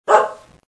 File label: dog